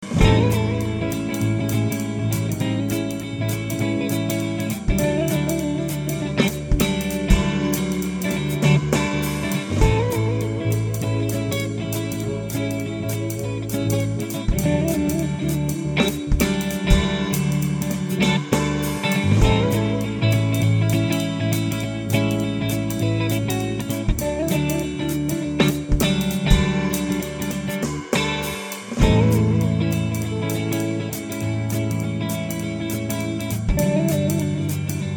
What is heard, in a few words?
spring jazz